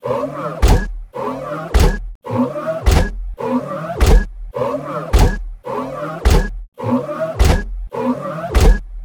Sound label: robot walk; pandemonium; 3d platformer enemy